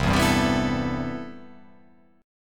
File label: C Major 13th C